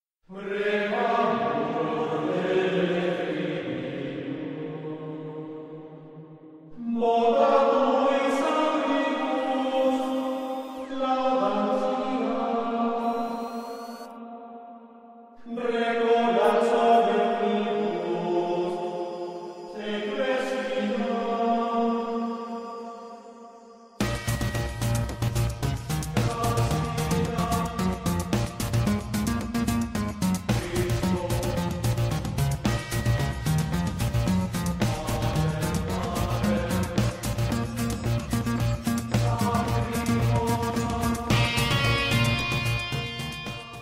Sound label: Futuristic Music